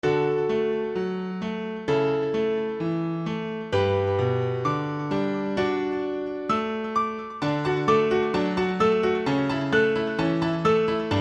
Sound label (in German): froehliche akkordfolge harmonie